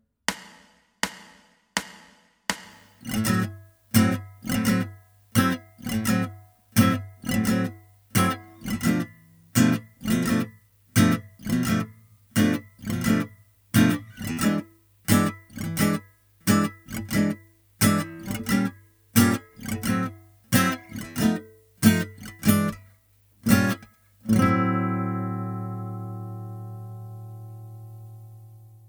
gypsy lick backing slow; damjan pejcinoski; lick of the week no 34

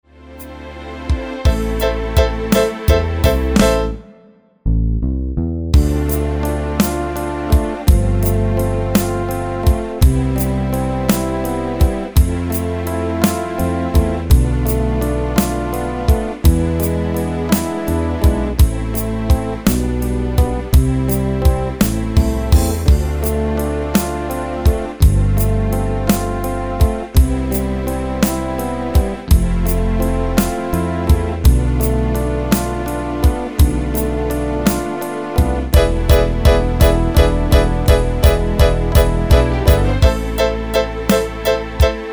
backing track full speed; josep suller; lick of the week no 33

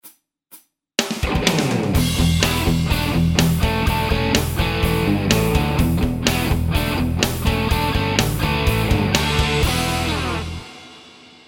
Lick BT fast, guitar; lick of the week no 24; irene ketikidi

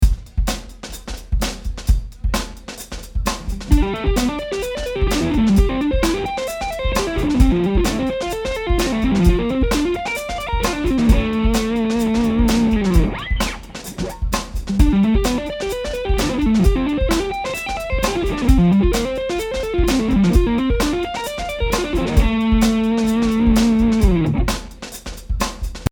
Lick, guitar; philip braithwaite

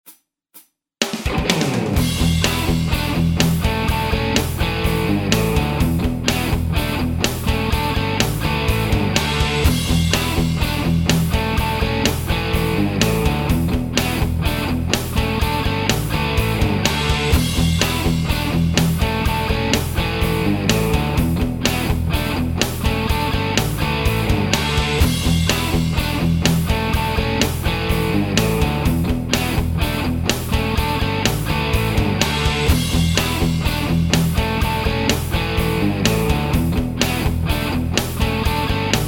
jam track; lick of the week no 24; irene ketikidi

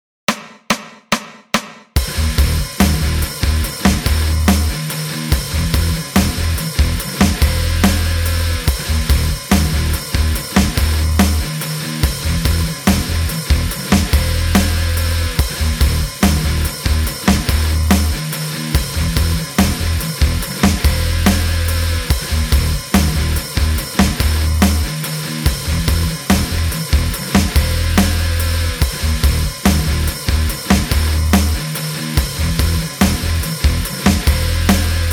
jam track 143bpm; marko pavic; lick of the week no 25

